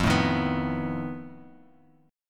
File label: F 7th Flat 5th F Bass Standard; strummed; chord; F7b5; 13,12,13,16; JGuitar